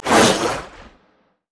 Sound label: launch; LoL; syndra